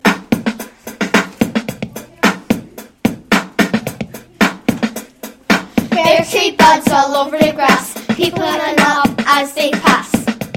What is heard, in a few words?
Spring Rap